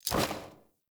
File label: Generic Weapon Light Aim Transition Out; Warframe; Weapons